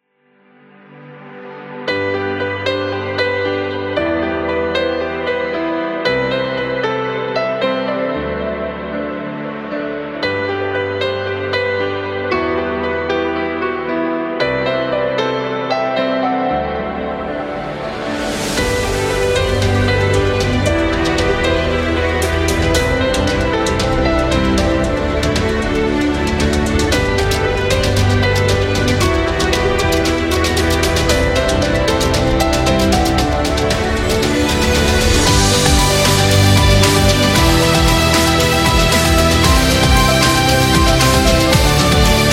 Wedding Piano

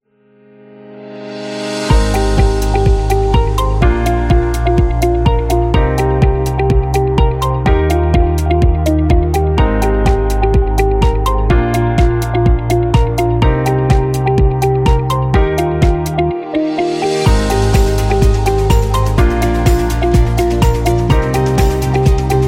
Corporate Happy; conference

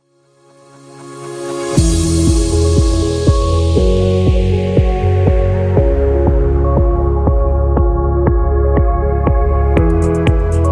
Modern Fashion

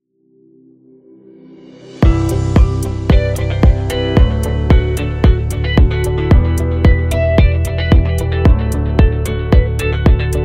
Educational Corporate

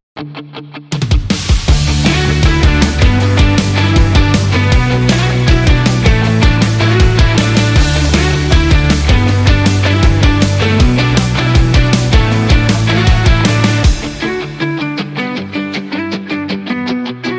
Indie Pop Rock

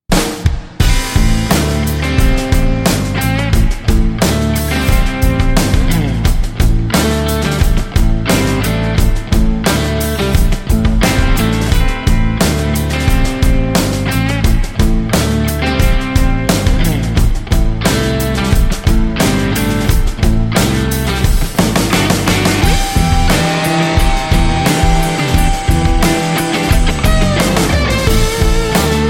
Blues Guitar Logo; blues intros

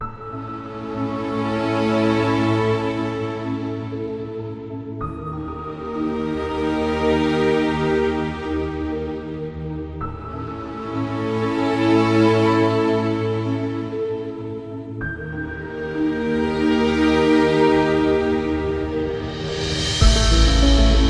Sci Fi Soundscape; cinematic action

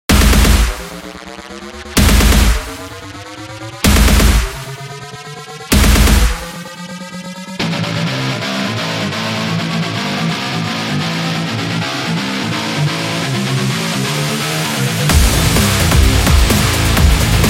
Rock Breakbeat; sports training energy

